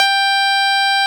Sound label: Fingered Bass G3